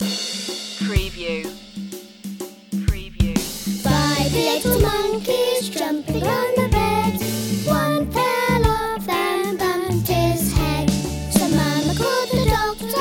children vocals; five little monkeys

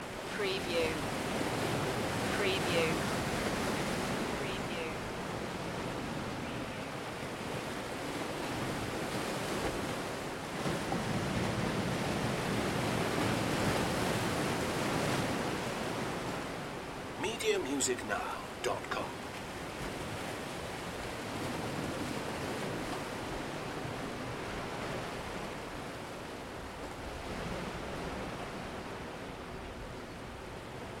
Smooth Ocean Surf Loop 50ft distance

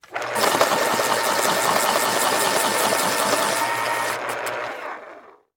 Pencil Sharpener

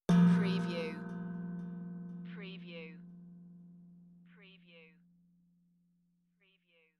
Tongue Drum Meditative Tone; beanstalk audio

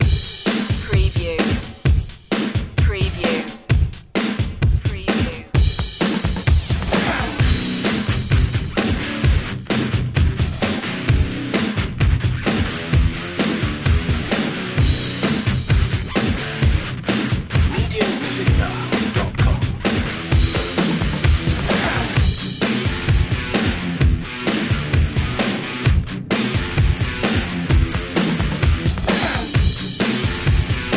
rock box

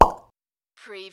CARTOON POP

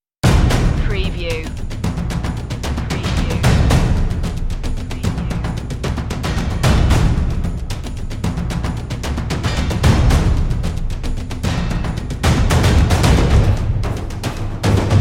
dramatic percussion less drums

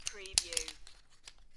Poker Chips Handling and Moving Chips

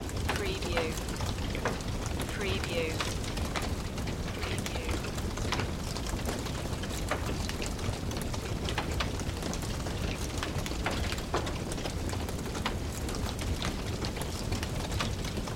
House Interior Light; Sound Effects Bible

